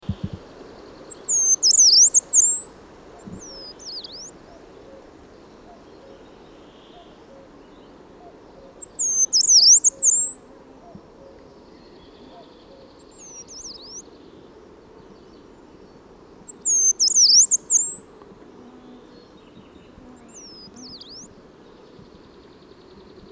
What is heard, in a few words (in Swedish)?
Taigasangare